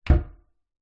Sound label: household laundry chute wooden lid close light reverb from chute; zapsplat